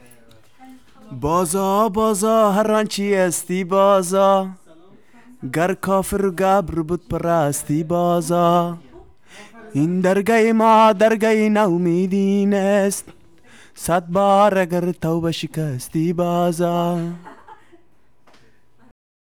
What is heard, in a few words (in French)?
chant